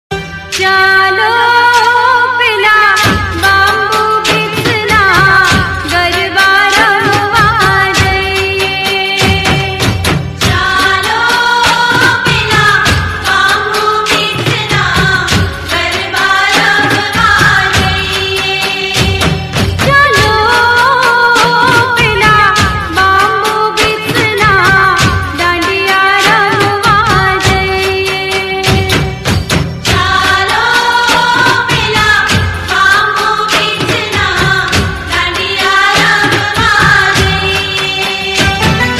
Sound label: navratri song ringtone; Navratri Special; Ringtones